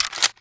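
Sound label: ammo pickup rifle, weapons foley; Spearhead Demo; MacFormat 2003 June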